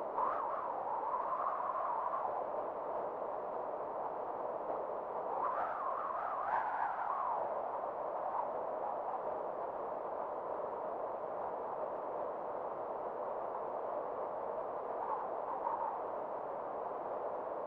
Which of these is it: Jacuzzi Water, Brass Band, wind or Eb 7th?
wind